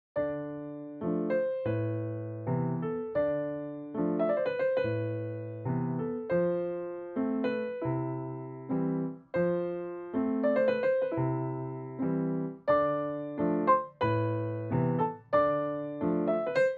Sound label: Valse, Bos Galaxy, piano